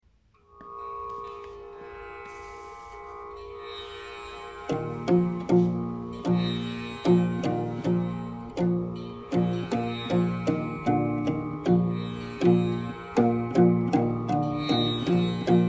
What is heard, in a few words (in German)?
maultrommel tambura; musikarchaeologie